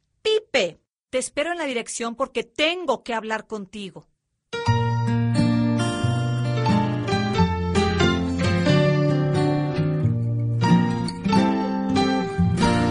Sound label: pipe